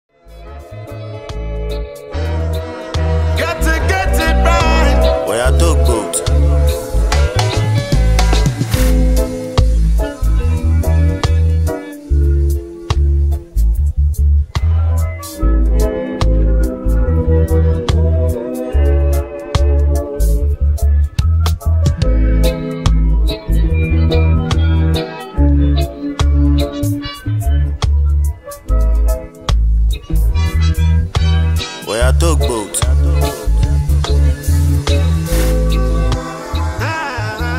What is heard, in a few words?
OPEN VERSE Instrumental BEAT HOOK